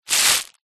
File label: foley bag plastic small very thin movement